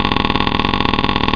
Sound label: stall, 737-800